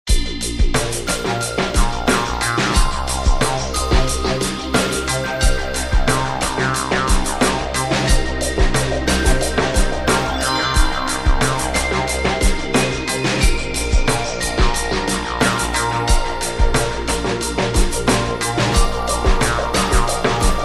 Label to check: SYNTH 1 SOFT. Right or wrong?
Right